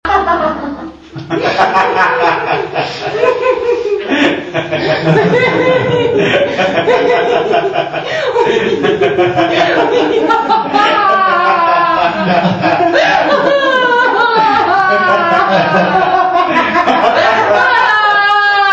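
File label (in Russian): smeh klub, laughter, club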